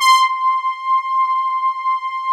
FATTY PADS, JV PADS; Pro-Rec - Synthsonic

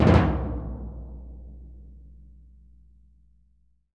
TMP C#, Synclavier Percussion

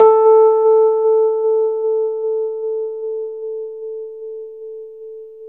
Rhodes 73 Slow

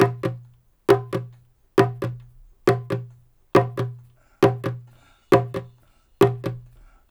135 BPM, perc loops, dub

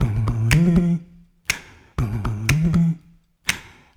HMM, 120; Spectrasonics - Supreme Beats - World Dance

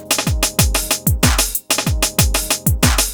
BEAT, Beats 93-96bpm; PlatinumPhattCD1; E-MU Producer Series Vol. 8 – Platinum Phatt

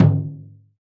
Dofs Nur Dom, Percussion; Roland BK-5

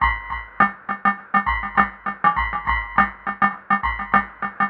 Drum Fx Loop, Electronica

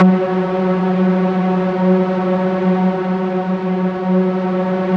F#3, Yamaha VP-1; Music Store

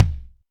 Kik Deep 24 inch, Drum Machines Alesis D4

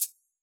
shaker, Yamaha DJX Rock Kit; 144 Rock Kit

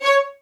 MED ATT C# 4, soft violins; Q Up Arts; Denny Jaeger Master Violin Library

